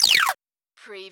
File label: CARTOON CHIRPS